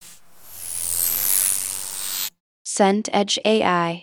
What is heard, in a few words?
plane prop pass